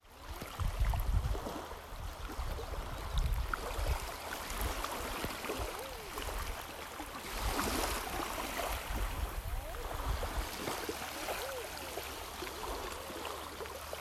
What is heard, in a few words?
Eider ducks on Loch Fyne; field-recordings